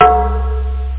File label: block